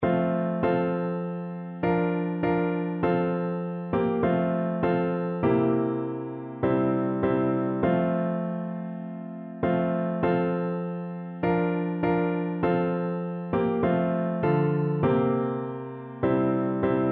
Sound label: piano